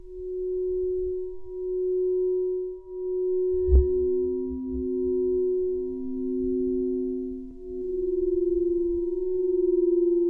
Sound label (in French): verre